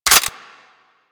Harpoon Gun Reload; Warframe; Sharkwing; Grineer

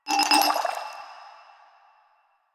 Vial Foley G; Warframe; Lasrian; Lasrian Soldiers; Footsteps; Lasrian Goonadier

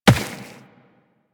Run Rock, Footsteps; DE; Warframe; Tenno

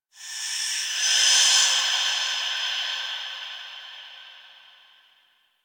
Breaths; Warframe; The New War; Zariman

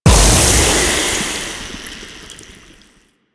Toxic Ground Blast; Warframe; Infested; Ancient

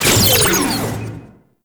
Power Down; Warframe; Corpus Gas City; Spy

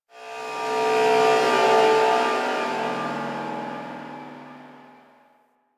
Mid Texture; Warframe; Quests; TheNewWar; Ambience; Zariman

